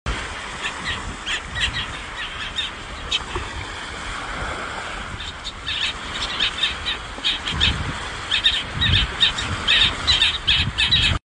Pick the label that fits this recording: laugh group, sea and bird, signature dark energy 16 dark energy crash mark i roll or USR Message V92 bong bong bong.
sea and bird